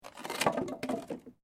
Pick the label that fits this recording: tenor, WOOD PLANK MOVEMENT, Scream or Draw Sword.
WOOD PLANK MOVEMENT